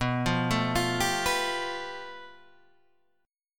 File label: A 7th Suspended 2nd Sharp 5th